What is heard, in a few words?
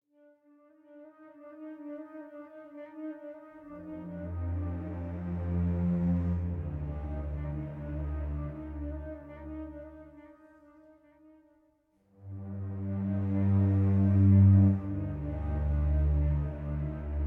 World Music